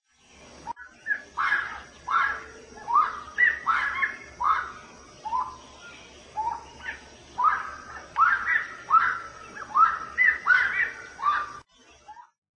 canto saracura do brejo

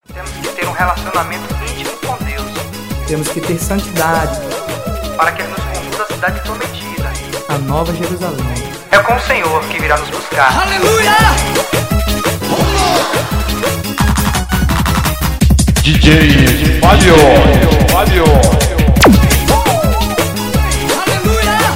gospel

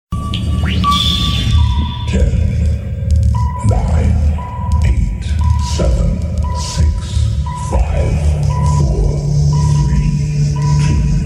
ao vivo abertura; em taua

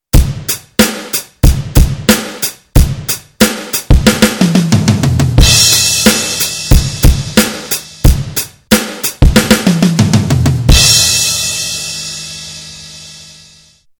digital drums exemplo virada iniciante

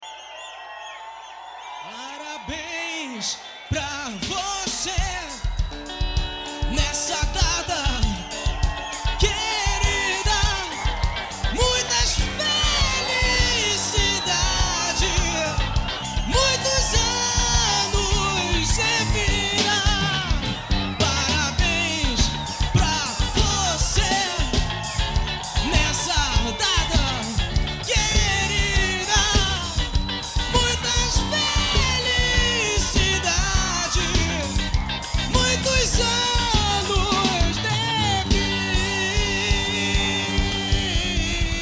parabens pra voce; espontaneo

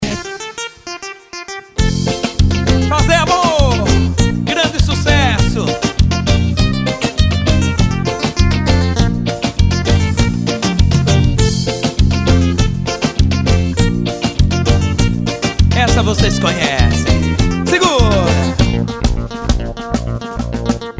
tambor

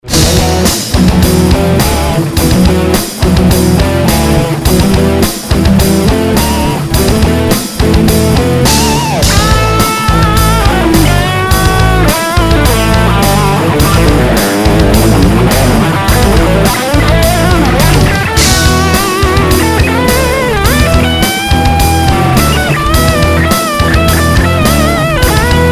instrumental

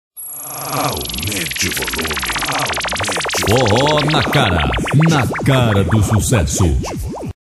vinheta forro

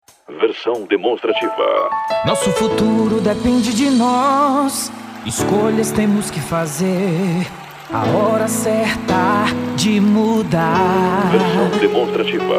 jingle axé; eleicao2020; ronaldo mourao; jingle inedito

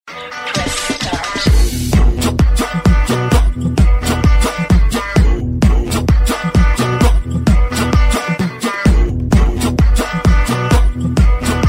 electro funk

